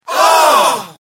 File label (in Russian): zvuki nepriiatnogo shoka u cheloveka